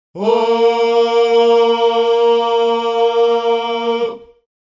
african vocals male oh

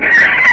starwars, Electronic Hits, DRUMS (SINGLE HITS)

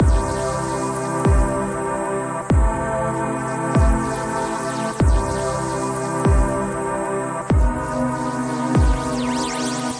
096 aliasy pad chord progression; SAMPLED MUSIC LOOPS

